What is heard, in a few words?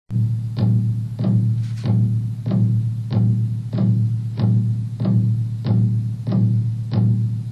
drum beat alone